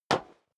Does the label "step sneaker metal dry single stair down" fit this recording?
Yes